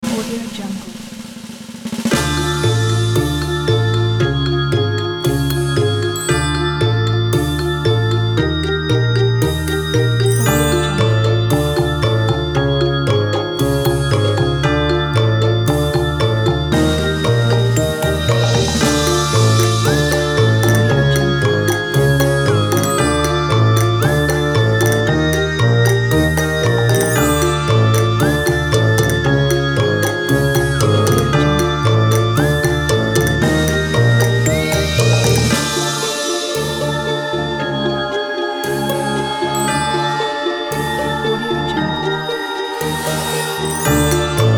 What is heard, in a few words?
Spooky Halloween